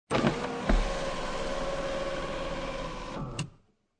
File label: Transportation Automobiles, Car Interior, 1996 Acura Integra, Sunroof, Open Sunroof, Automatic, No Engine